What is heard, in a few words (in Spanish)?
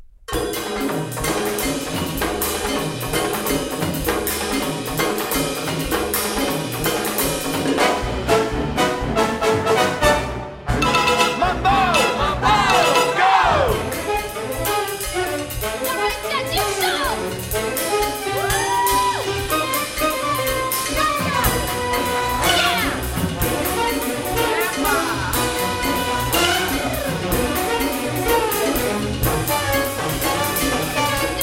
mambo